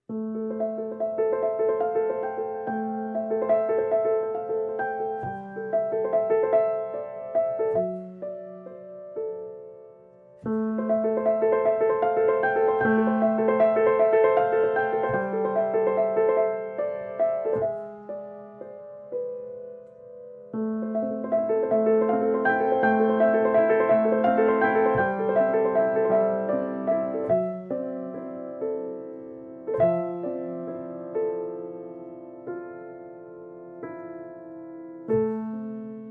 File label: Piano